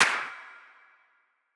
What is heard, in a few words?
Snare, Dubstep Snares